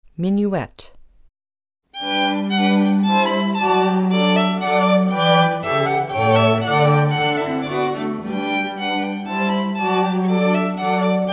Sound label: minuet, string quartet